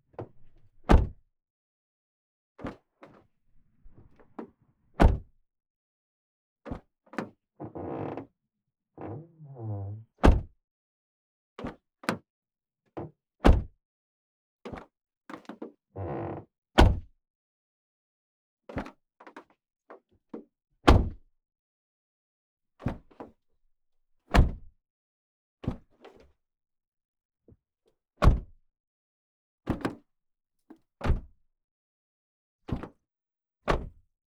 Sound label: Hyundai Sonata Car Door Open and Close Exterior; AUTOMOBILE; 2013; MS; MKH8050